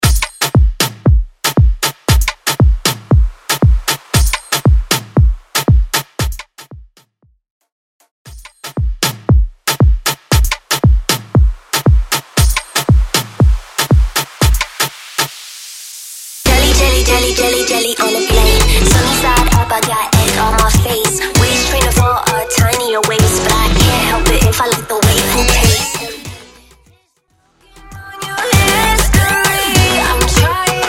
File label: Tribal Bootleg; Mainstream VIP; 130 Bpm